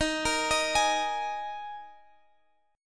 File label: Eb Suspended 4th Eb, down, Guitar Half Step Down